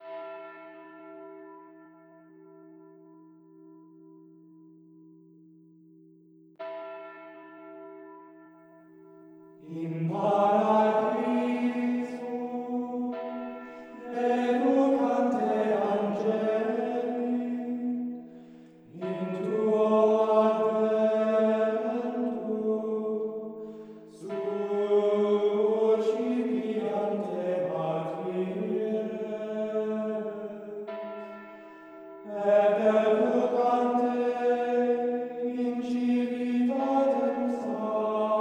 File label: Antiphon In Paradisum